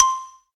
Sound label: match join; saiyku [rby] instafade